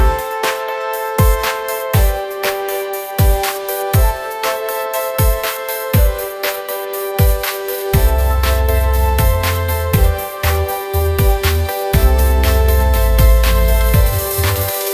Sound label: shine on success pop